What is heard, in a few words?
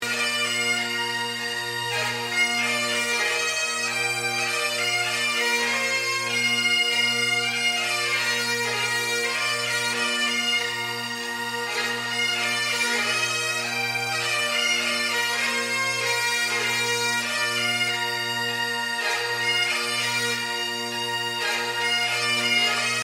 scotland the brave pipes and drums